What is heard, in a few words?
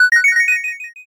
sparkle